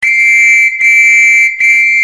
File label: police; metro